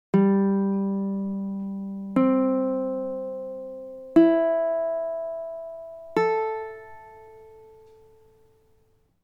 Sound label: tuning check uke std; Hear all strings (standard)